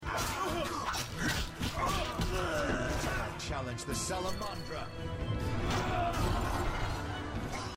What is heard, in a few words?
fight cave; The Witcher